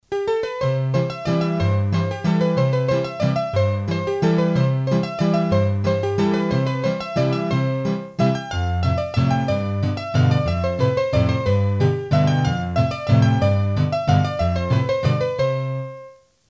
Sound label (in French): Mazurka trad Auvergne, hurdy-gurdy; Mazurkas